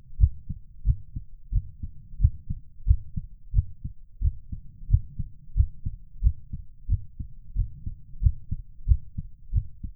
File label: heartbeat; death